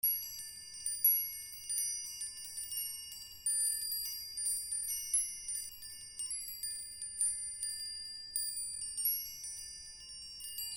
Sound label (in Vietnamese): Tieng chuong gio nhe nhang